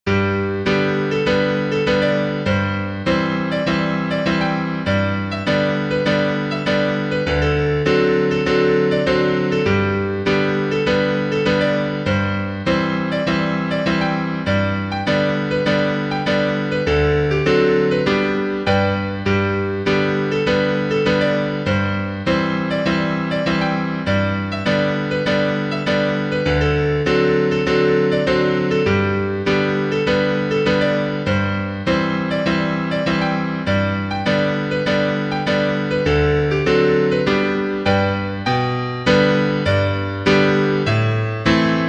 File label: piano